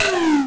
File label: lofi sega sample; 8 BIT; Lofi Sega Samples